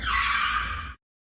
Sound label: car screech